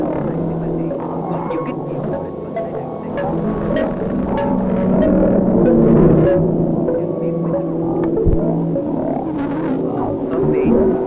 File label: wren; Loops recorded mostly with a loop pedal, others made in audacity and software of that ilk